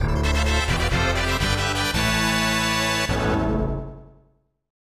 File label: Warrior's Fanfare; Alcahest